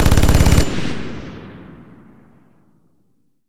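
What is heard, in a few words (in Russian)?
выстрел из минигана по целям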